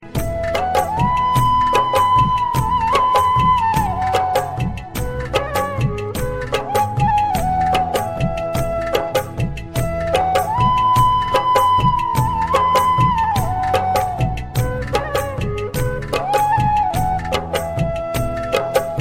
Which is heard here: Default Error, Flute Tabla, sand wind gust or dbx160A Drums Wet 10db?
Flute Tabla